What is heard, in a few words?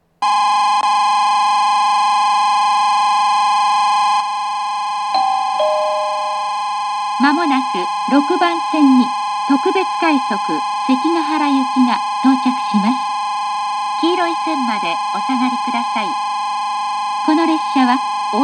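Nagoya approach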